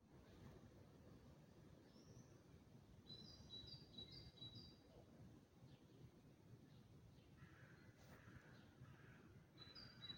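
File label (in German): Dawn Chorus, Vogelgesänge vor Sonnenaufgang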